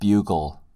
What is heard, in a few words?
bugle